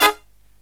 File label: HIGH HIT